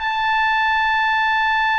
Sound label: tuba; E-MU Producer Series Vol. 5 – 3-D Audio Collection; 3DSprints; 3DMirabilisMod1